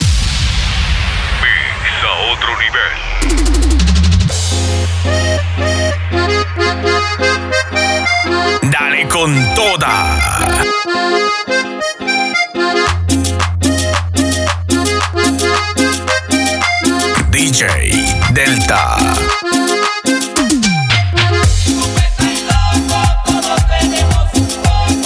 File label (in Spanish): entrada cumbia